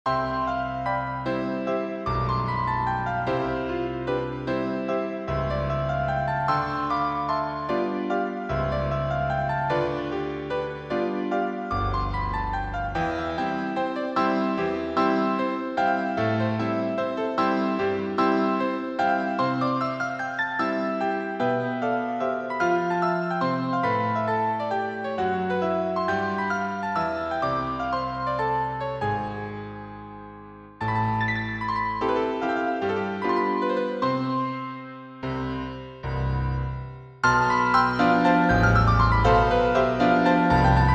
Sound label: waltz; waltzes